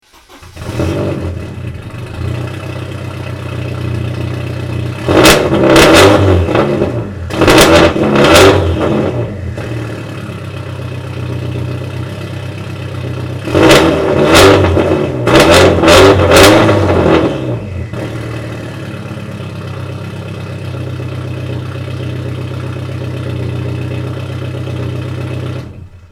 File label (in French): 944 TurboCup echappement libre, engine sounds; porsche